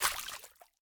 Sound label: Step Water; MiSide Demo